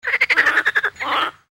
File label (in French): Cri; MOBICLIC 65